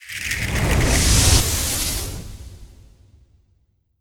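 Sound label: Orc Sorcerer Flame Attack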